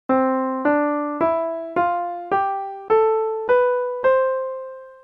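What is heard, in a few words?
c major scale; scale mode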